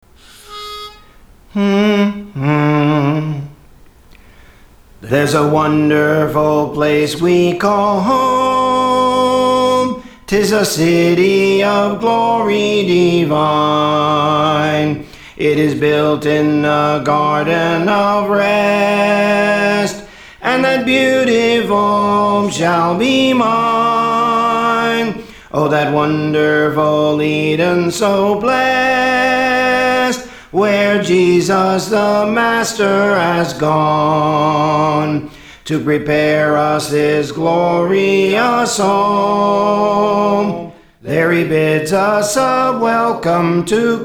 Soprano; Praise For The Lord Training

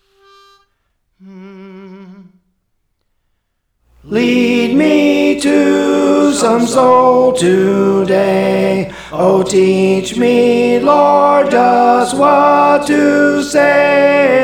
Tenor, Songs; Praise For The Lord Training; Lead Me to Some Soul Today

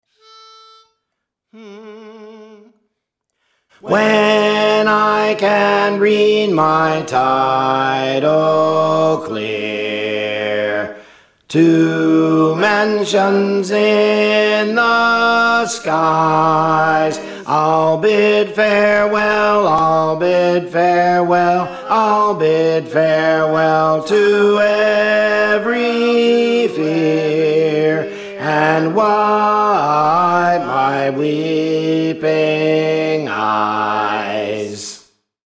Bass; Praise For The Lord Training

